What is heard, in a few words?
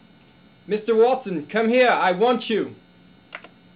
bell